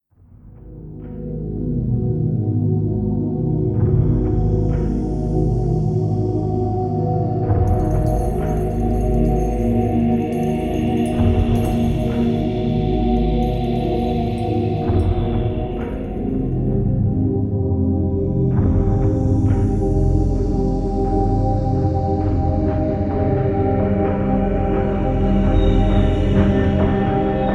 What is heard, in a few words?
Item Box; resident evil 5